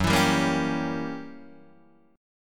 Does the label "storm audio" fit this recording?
No